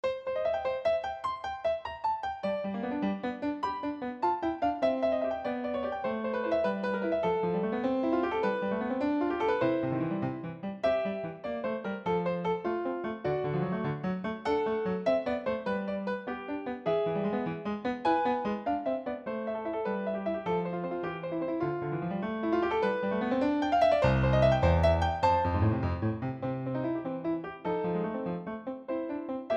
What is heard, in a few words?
Polyphonic Sketch; Polifonicheskij eskiz